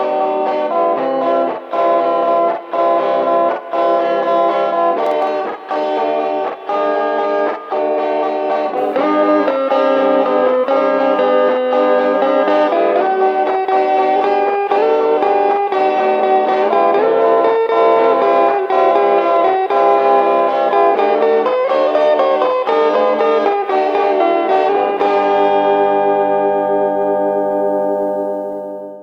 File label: guitar solo